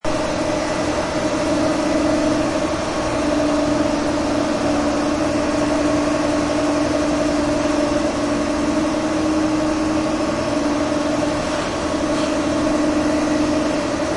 industrial workshop factory ambience heavy machine hum changes in middle; general fusion; with alarm